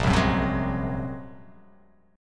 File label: A sharp 7th Suspended 2nd Sharp 5th